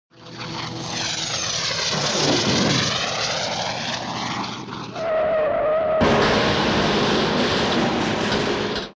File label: air crash, Vehicles